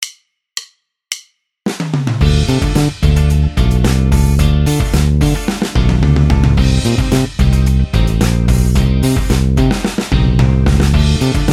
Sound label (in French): hammers